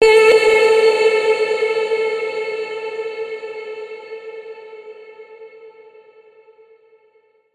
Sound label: edm vocal